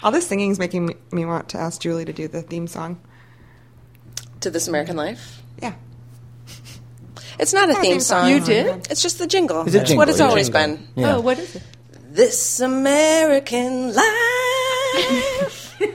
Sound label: sings the jingle